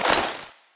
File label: chainsaw slash end, weapons